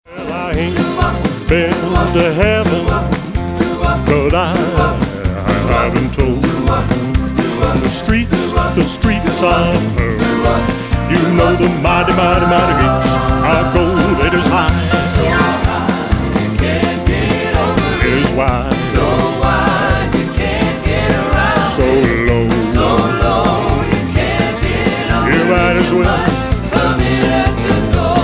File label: Gospel Medley